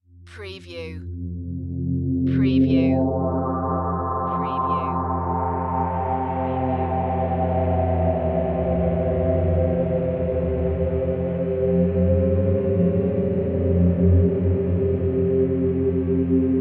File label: Drone Synth Eerie; Sound Effects Bible